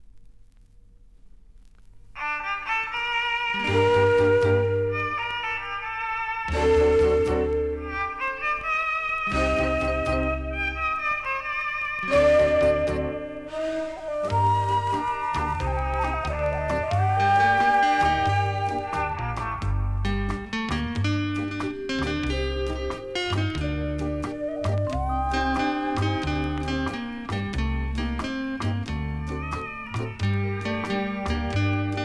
orchestra

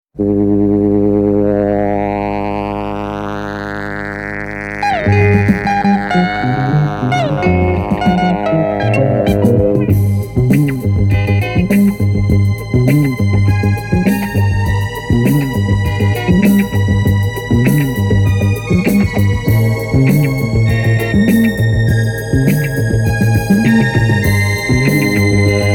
moog

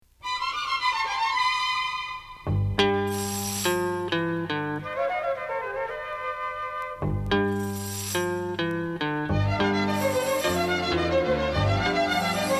strings

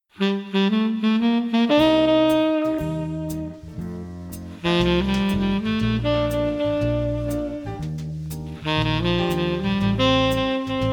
big band

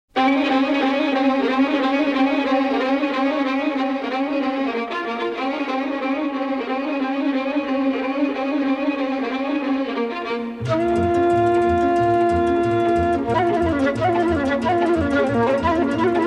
nai, folk orchestra, rumanian folk music

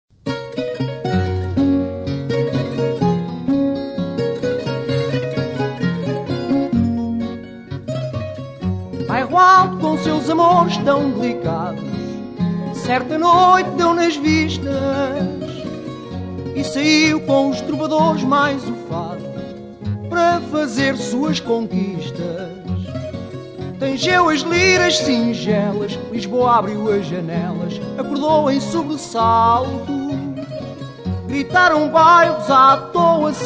fado; Bairro Alto

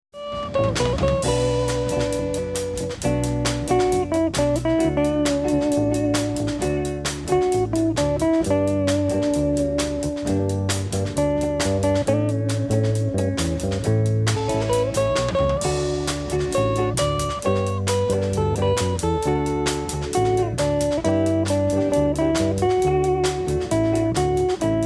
instrumental; Советский рок 60-70

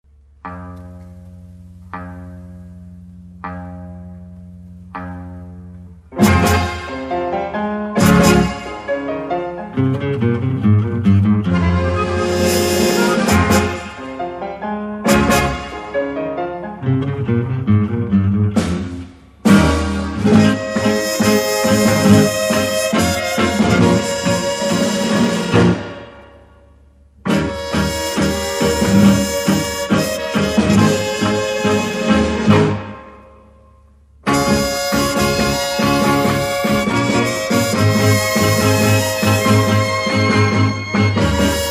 tango

